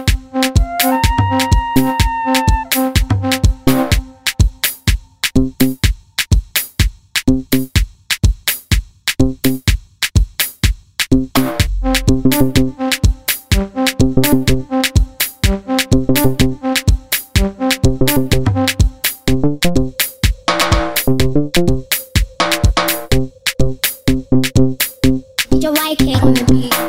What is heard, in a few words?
Dj Ringtone